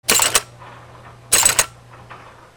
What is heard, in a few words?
INDUSTRY